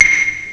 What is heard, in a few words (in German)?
Geschirr; ST-XX Amiga Soundtracker Sample Packs